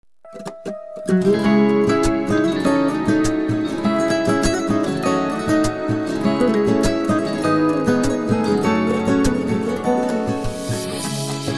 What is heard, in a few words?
instrumental; persian